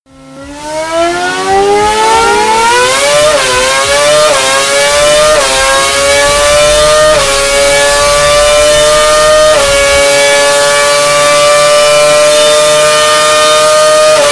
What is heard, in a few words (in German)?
F1 Motor Sound Mercedes AMG; petronas f1